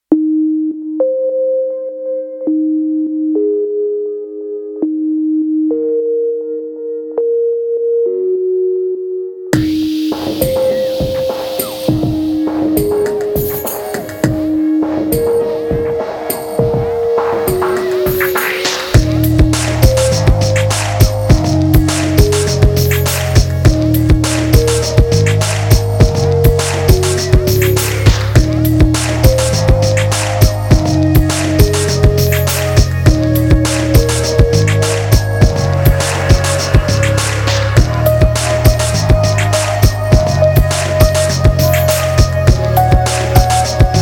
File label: Elektronika Chillout; SampleTank 3 CS